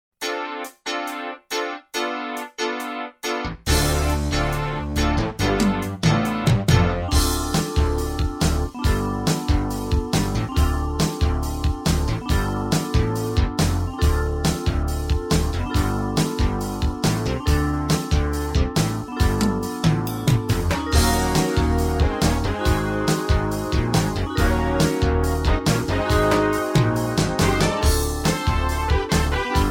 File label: News Bed